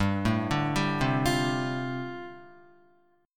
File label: G 7th Suspended 2nd Suspended 4th G, Guitar; G7sus2sus4 arpeggiated